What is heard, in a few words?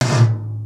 GTOM, drum; Crypton - DrumBase5000; GATE KITS